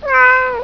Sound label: miaow